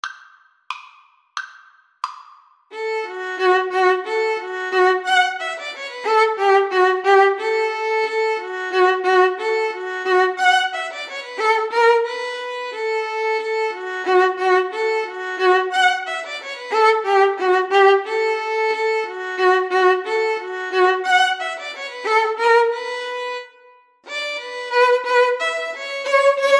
violin duet

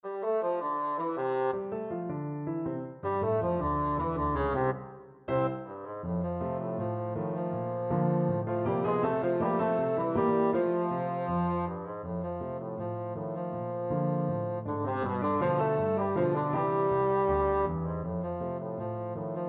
the entertainer, bassoon

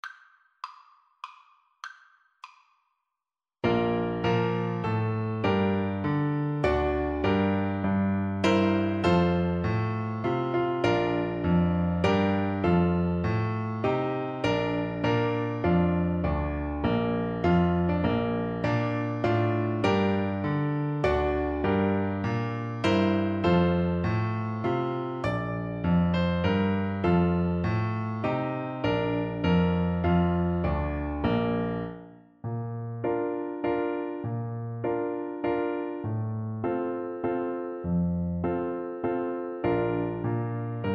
star spangled banner, Tuba

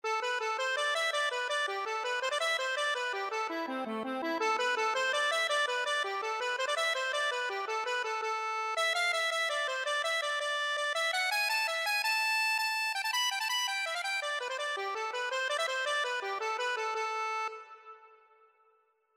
accordion

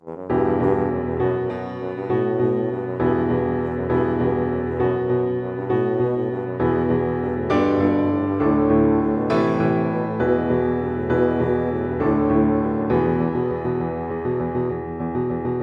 pirates, tuba

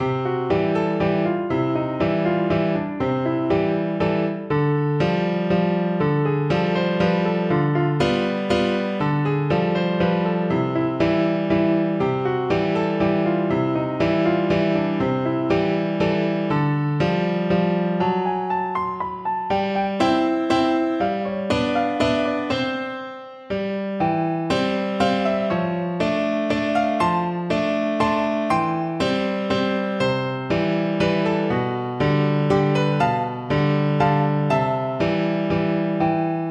strauss fledermaus, piano

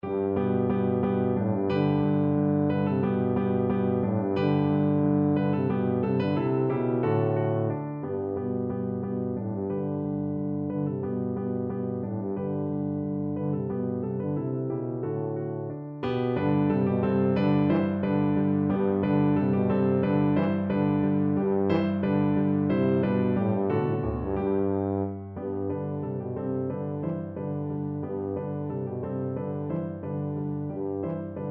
vivaldi spring, tuba

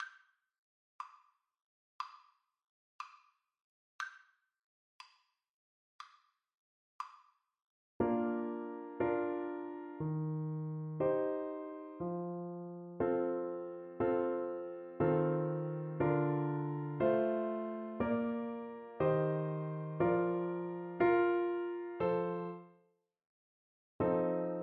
romance, oboe

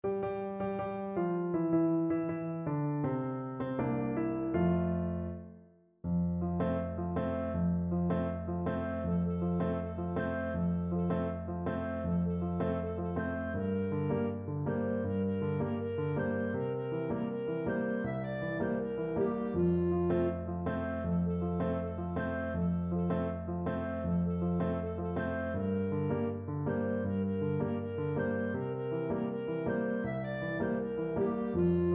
el cafe, clarinet

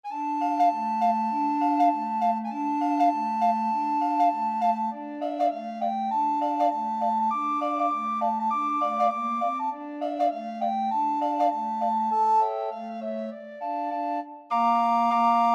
recorder quartet

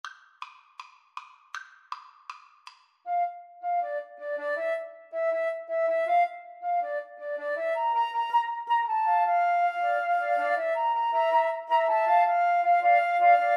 la bamba, Flute Trio

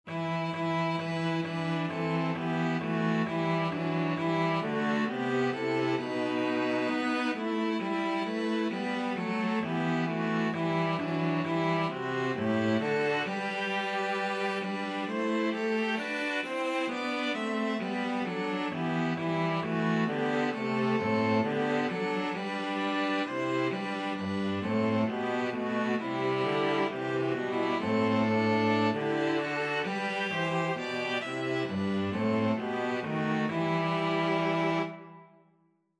string trio

